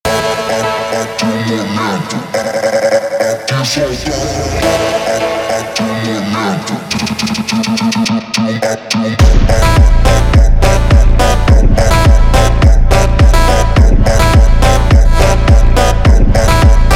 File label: Montagem slowed